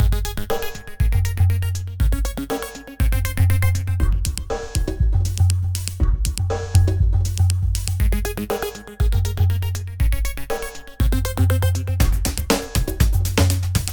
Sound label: cellophon